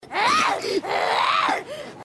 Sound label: growl; nezuko